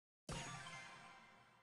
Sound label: Button Sound Effect